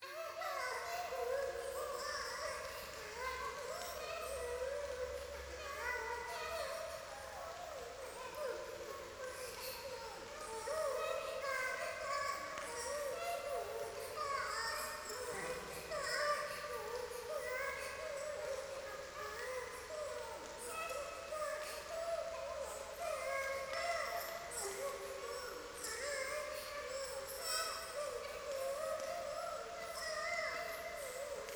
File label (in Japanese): 可愛い喘ぎ 雨